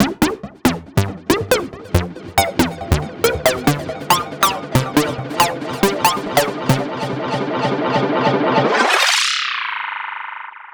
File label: Bpm139 Synth Loop G, Dubstep; Aeros